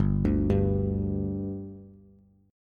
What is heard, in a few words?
G 5th G Bass, chord; G5 arpeggiated